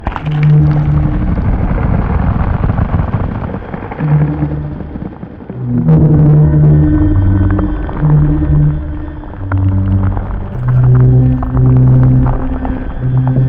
JOVE sleeping Loop

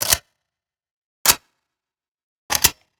rifle Reload

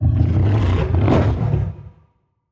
attack; CHARYBDIS

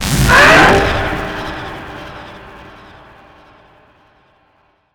JOVE damage

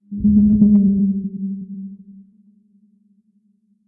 death; MOLOCH

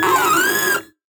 DEFENCEBOT damage

